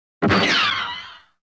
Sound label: Synth Gun Shot Ricochet